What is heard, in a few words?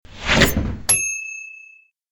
guillotine